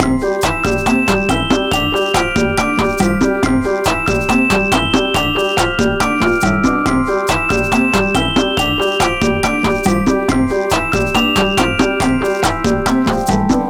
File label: reveal music Stuffed Animals; Spanish